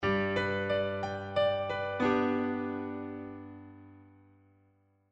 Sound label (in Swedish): Tonartsgivare G